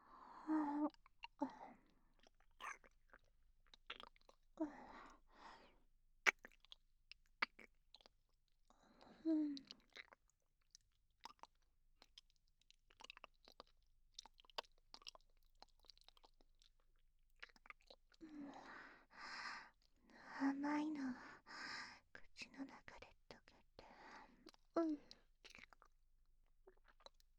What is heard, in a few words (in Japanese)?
飴玉舐めながらキス; ごっくんボイスコレクション第一弾